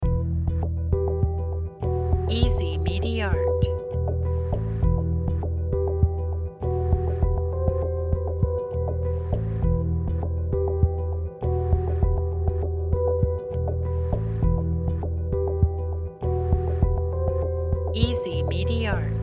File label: suspenseful ident looped